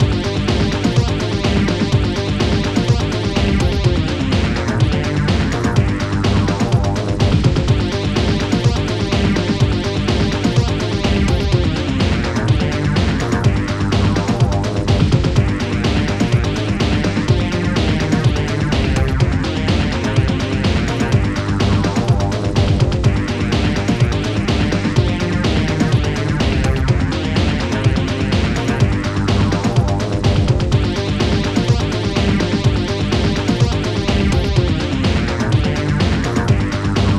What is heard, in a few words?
High Score; Rotox; Amiga